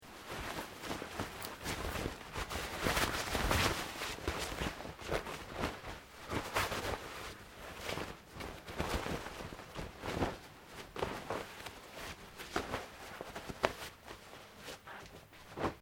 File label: Cloth Nylon Movement Sequence; Material components for constructing more complex sounds; Dressing Sound Effects